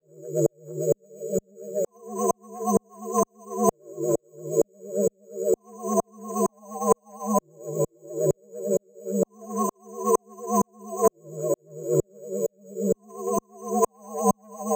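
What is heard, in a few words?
synth loop wadawada 130 F minor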